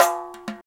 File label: PER Percussion; Mix-Percuss